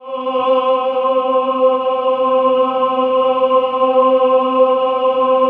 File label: CHOIR; Best Service; Robot Computers